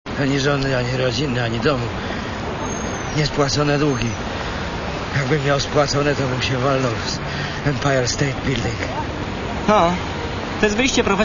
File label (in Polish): organy